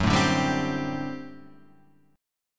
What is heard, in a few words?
G Major 7th Suspended 2nd Suspended 4th Gb